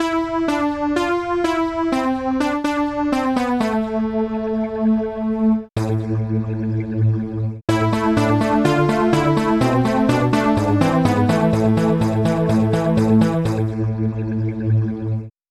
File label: chiffer lead